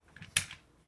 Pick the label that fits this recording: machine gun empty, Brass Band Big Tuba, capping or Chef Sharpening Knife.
capping